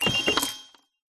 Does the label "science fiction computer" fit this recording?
Yes